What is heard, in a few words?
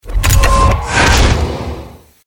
attack; hero sound